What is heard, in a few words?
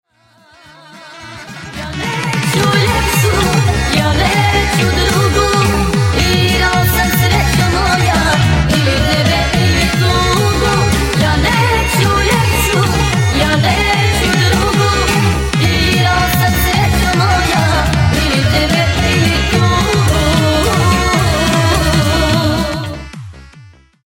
Trance